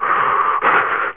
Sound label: gasp